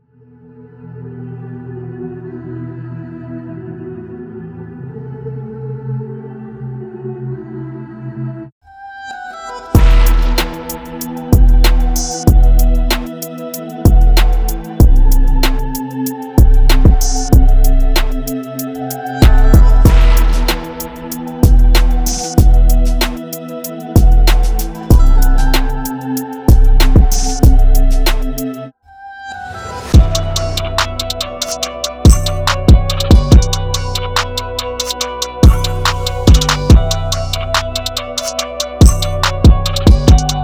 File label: Deutschrap Drum Kit; Vol. 4 Edition